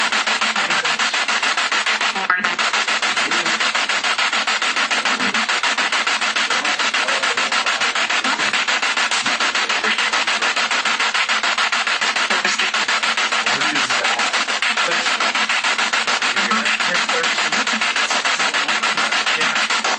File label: Kitchen Spirit Box